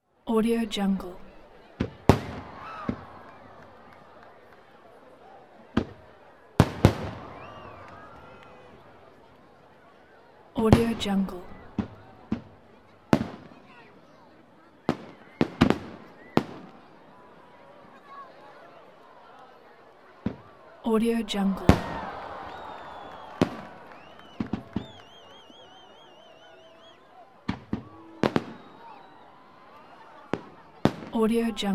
fireworks

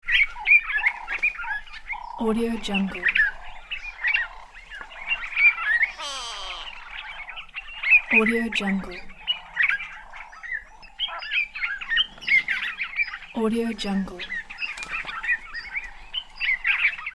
african savanna birds calling

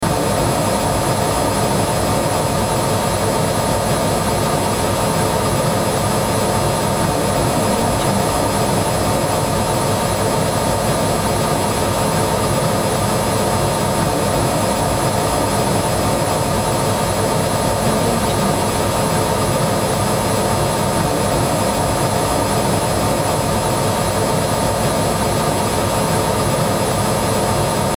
washing machine

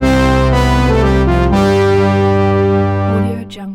mobile game musical theme bass laser victory

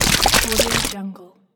dirt mud splat

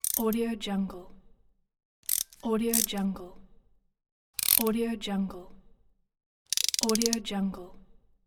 wrench

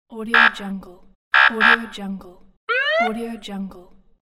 police siren

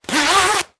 zipper plastic

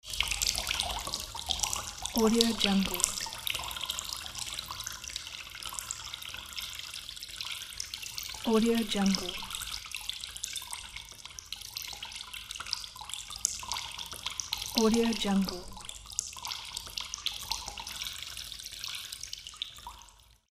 water dripping sound; soundhq

